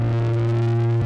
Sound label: sfx elec loop; Stunt GP